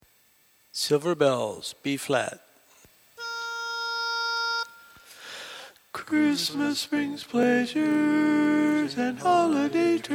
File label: Tenor, Learning Tracks